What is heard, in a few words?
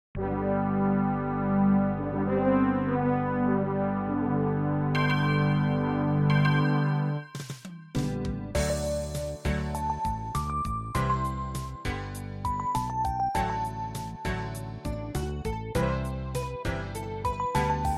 MIDI Theme